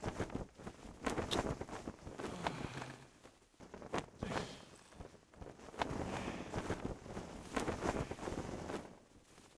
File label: incubus idle